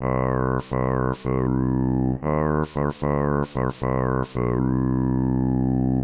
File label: arf bad